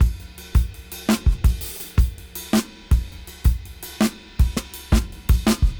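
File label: Funkragga 82 BPM Reverse; AMG - Keith LeBlanc Phat Butt Jams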